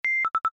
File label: Workout Pace Below, iOS Sounds